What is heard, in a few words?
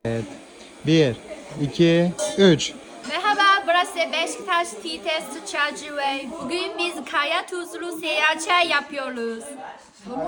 vocals; htdemucs 6s